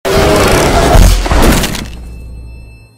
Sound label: jumpscare; project playtime